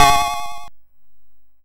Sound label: YM Chord C#; ChipShop Deluxe; ChipShop Hits